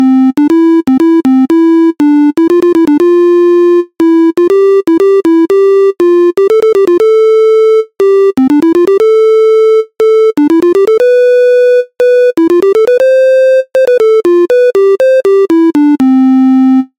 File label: chromatic doremi